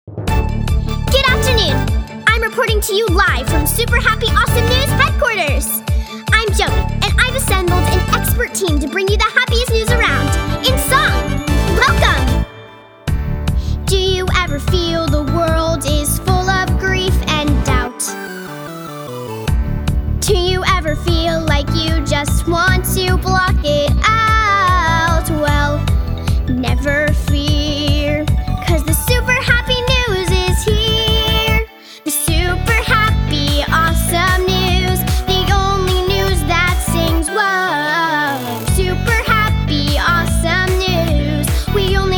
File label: VOCAL